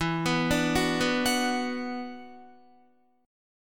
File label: E 7th Suspended 2nd, down, Guitar; E7sus2; arpeggiated